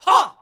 vocals shouts "hah" double; TKO

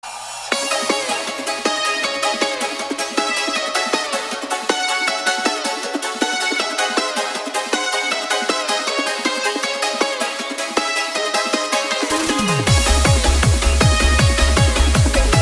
Eurobeat Anthem

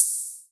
hat; Rialasten 2022 Xmas Sample Pack

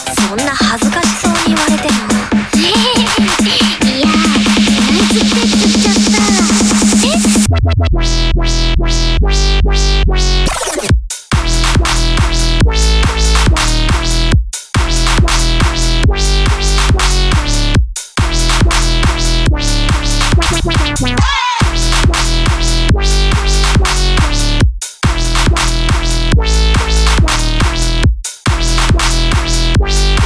BASSLINE

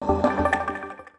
100BPM C; Prologue; Rialasten 2022 Xmas Sample Pack; 2022 Xmas Packs

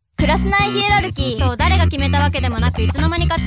Fan Fanfare

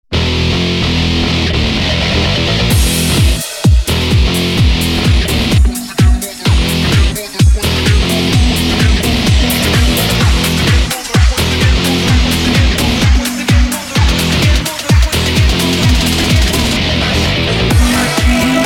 Alien Bassline

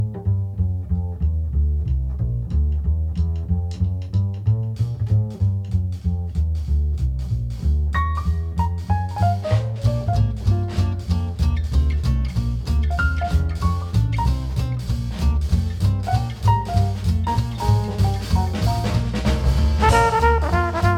Gentle Forest Jazz

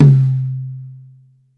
TOM, Jungle; Gorge Sample Pack Vol.1; San Gabriel

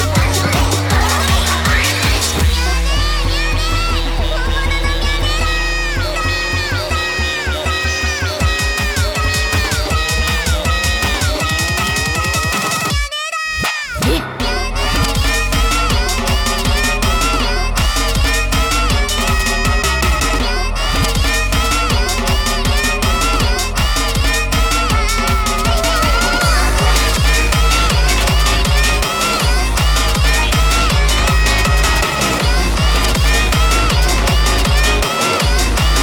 HardBass

